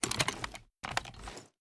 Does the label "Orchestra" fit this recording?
No